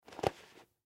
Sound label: Cd Rack Twist Lid Open; Foley; Twists; SoundEffects-Blastwave